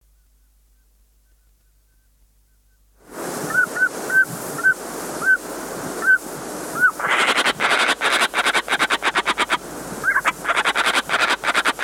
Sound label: gray jay, songbirds